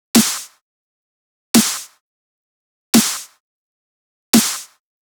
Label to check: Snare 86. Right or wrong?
Right